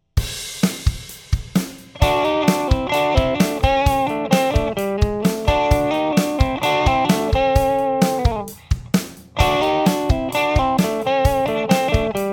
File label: Lick No EQ Distortion